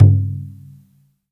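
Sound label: UGANDA drum, Heart of Africa; Spectrasonics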